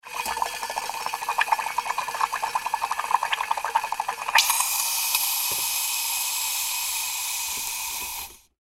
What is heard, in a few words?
Espresso Bubbles, Espresso Bubbles Steam Long Gurgle; Industry Restaurant; Household; Drink Mixing; Blastwave